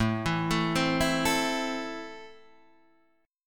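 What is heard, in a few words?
A Minor, Guitar Half Step Down, chord strum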